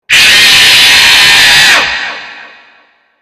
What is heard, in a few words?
jumpscare; bon bon